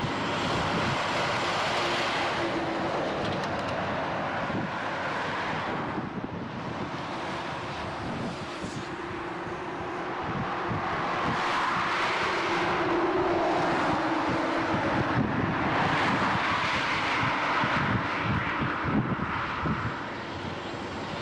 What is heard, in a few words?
soundscape